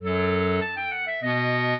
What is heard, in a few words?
clarinet; Mozart